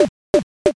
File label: hammer